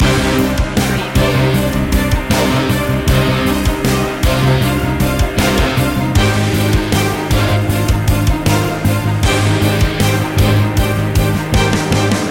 drama heavy loop

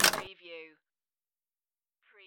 Pinball sound, bumper ka-klunk

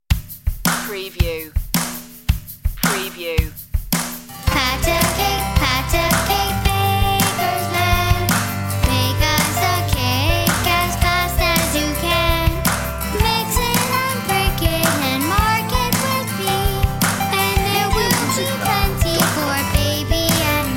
pat a cake child solo vocal

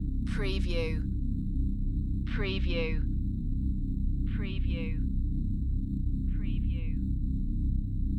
Space Drone (loop)

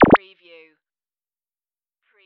future

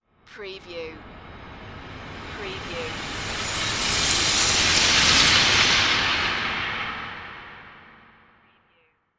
Swell Metal

